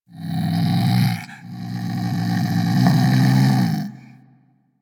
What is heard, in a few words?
Vocal Snore C; Warframe; Hunting Critters; Vulpaphyla